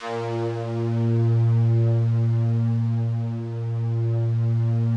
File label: FOG ATTACK, Yamaha VP-1; Music Store; 90's Sample CDs